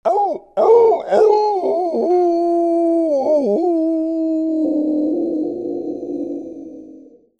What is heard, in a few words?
CARTOON